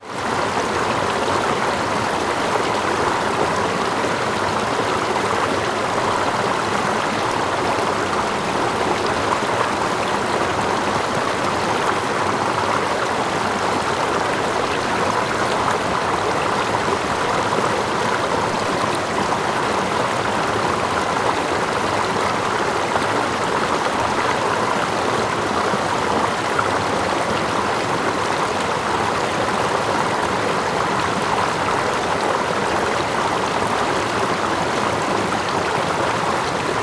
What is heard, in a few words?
nature waterfall top deep water flowing before falling